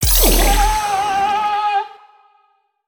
Celebrate; foley

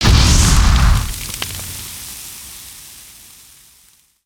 Samurai Arrow Impact

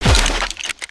Crates n Boxes, destructibles

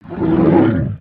Pet Dragon Speak; creatures pets

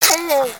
Pet Tortoise Moan; creatures pets

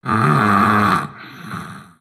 Pirate Snore; gnarled forest

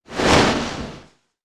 Flap Sweetener; creatures organic

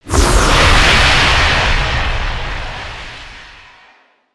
Rocket Trail; ambience

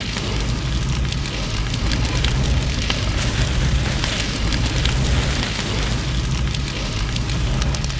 Fig On Fire Loop

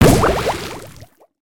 Water Charge; Buccaneer

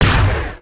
cannon blast; destructibles

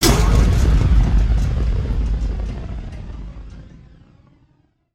Siege Field Skeleton Catapult

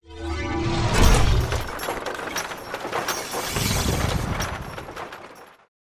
Siege Field Skeleton Catapult

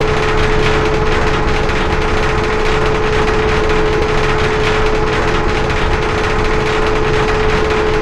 bridge lower raise, ambience

